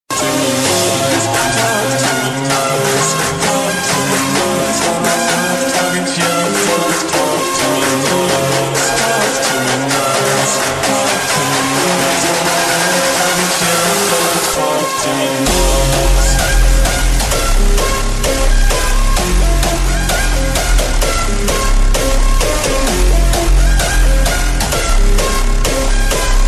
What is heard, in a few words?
hardstyle slowed